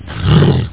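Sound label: pig pain; duke3d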